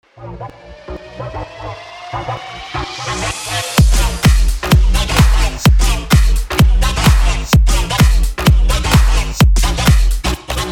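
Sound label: BED FUTURE HOUSE A# minor 128BPM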